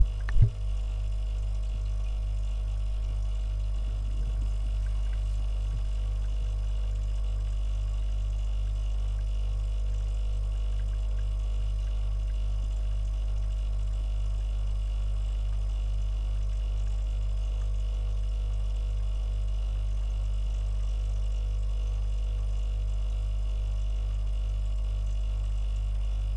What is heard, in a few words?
Fridge Running